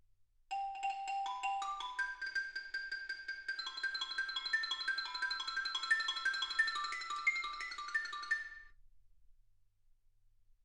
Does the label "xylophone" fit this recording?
Yes